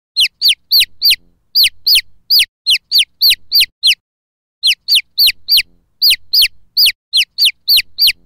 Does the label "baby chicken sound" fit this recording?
Yes